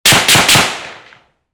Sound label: AKM 7.62x39mm in front off axis Triple shots; 55m